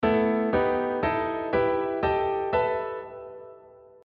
A pent (lydian b7) harmonized

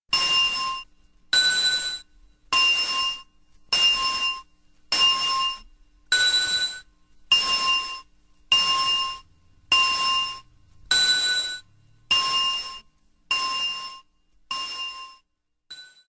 Bell

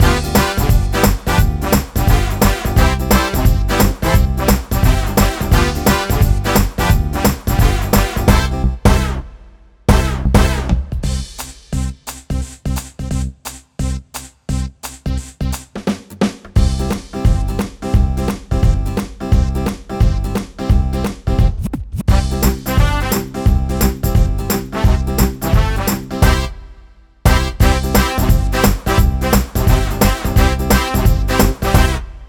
Euro Pop Mambo

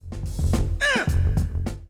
Shout ('ah!')

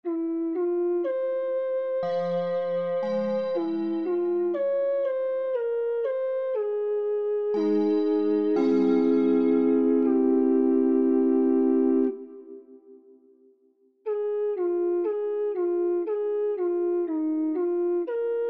the ocarina tune

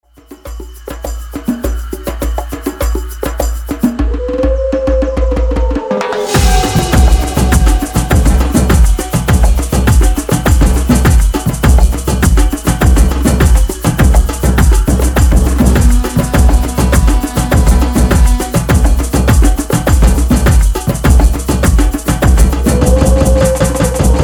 SAMBA, 51BPM

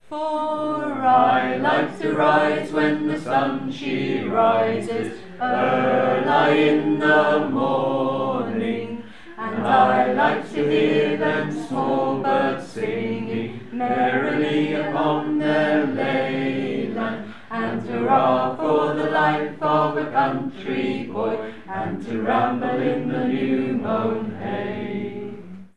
harmony; Country Life

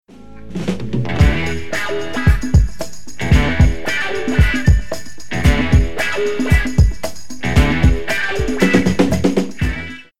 Guitar & drums

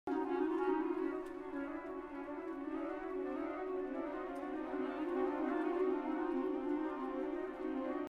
Clocks And Clouds; Ligeti

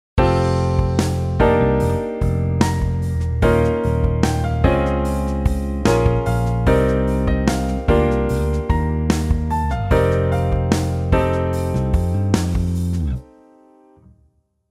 modal interchange

